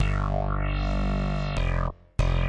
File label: HipHop Bass